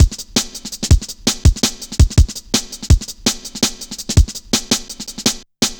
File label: Virtual, Drum Loops, 165.5, Jungle